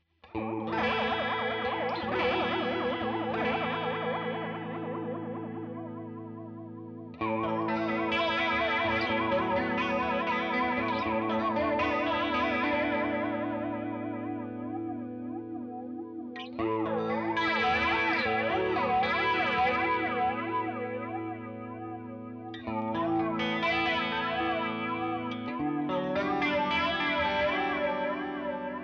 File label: AxisFlanger clean turning knobs